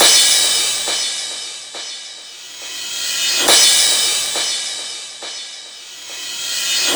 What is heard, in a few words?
Cymbal Loop, Dance Beats; Drums